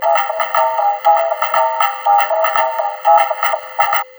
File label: RYTHM 120, Drum Loops Crazy Processed; USB Soundscan vol.07; AKAI